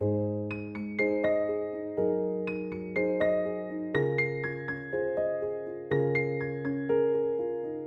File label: Piano, G#maj 122BPM, Music Loops; 99Sounds; Monosounds; PANDORA BOX!; Beach Season